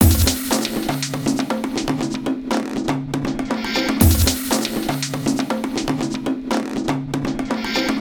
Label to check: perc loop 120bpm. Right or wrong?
Right